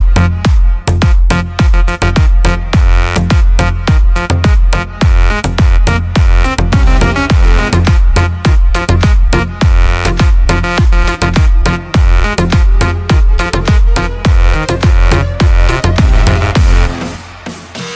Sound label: turkish electro ringtone